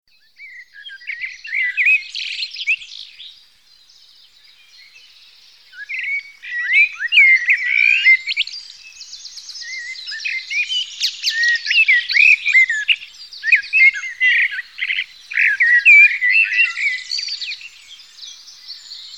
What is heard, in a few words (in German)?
Singvoegel Amsel